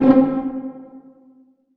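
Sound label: string pizzicato C3 mezzo forte left; Sound On Sound - Peter Siedleczek's Orchestra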